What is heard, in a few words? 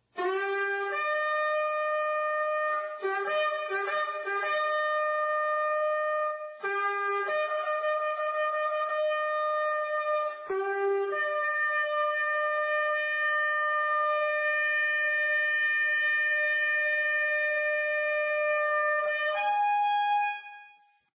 Shofar